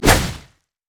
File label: pain; LoL; trundle